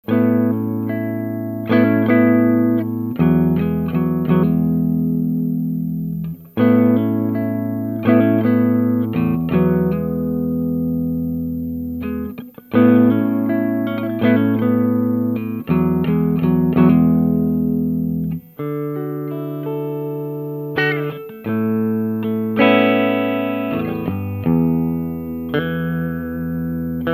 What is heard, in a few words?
Tele pentode filter